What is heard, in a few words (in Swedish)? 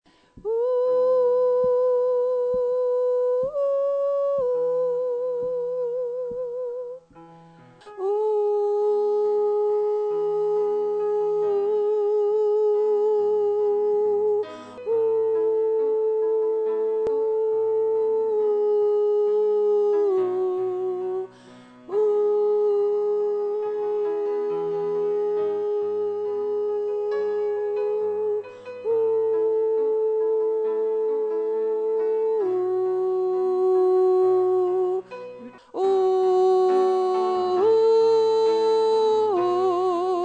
sopran